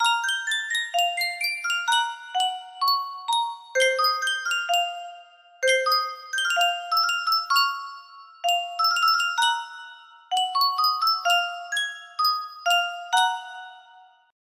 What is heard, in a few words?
Sankyo Music Box, music box melody; thomas the tank engine and friend